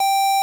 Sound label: pico G4